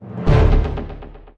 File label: match